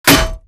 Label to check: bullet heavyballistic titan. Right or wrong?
Right